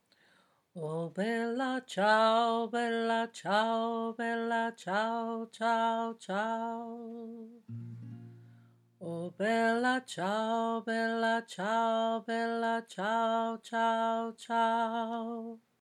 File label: Chorus lower harmony; Bella Ciao